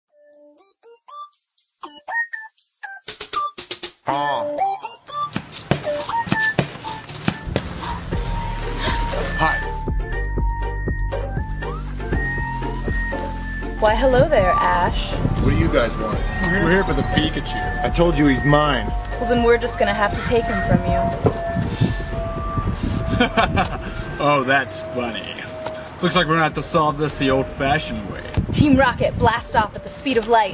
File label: rap; pokemon